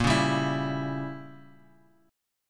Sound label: A sharp Diminished 7th, Bass Standard; strummed; JGuitar